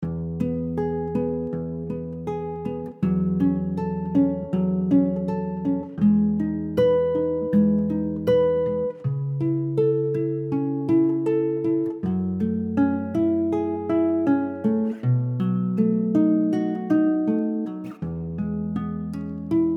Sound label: Classical Pieces 80bpm, Soft Nylon Guitar; MG Soft Nylon Guitar - MIDI Pack